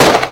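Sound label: single shot sound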